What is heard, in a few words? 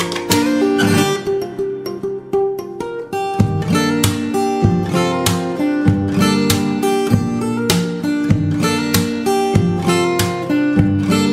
guitar cover